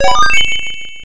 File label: coin; Art Of Fighting 2